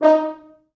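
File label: French Horns staccato; 4 FrHorns; East West; Quantum Leap Horns Sax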